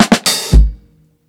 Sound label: 120 FILL STOP, DRUMS LOOPS; BASEMENT DRUMS VOL 2